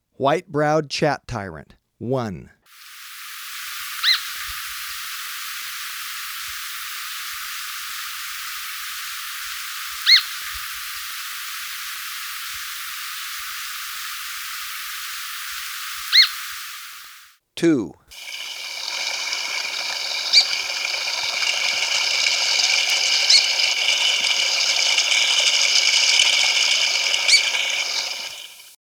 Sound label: White-Browed Chat-Tyrant, Birds of Ecuador; S. America